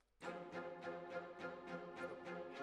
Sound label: Decca, Jazz for piano